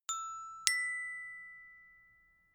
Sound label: notification glockenspiel fifth up